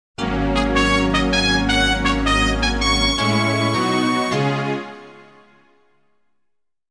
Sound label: Station Call; Sounders, Bumpers, CUES & IDENTS; DennisMusicLibrary